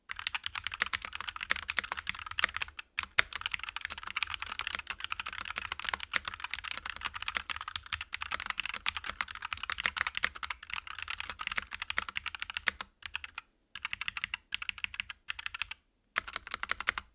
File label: logitech g pro x 60 soundtest